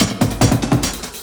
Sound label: LOOP SD; Ueberschall; Drum & Bass Resonance; 145 BPM